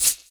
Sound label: Shaker; Equipped Music; Loungin House